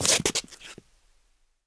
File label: shoe scuff, footsteps; survivor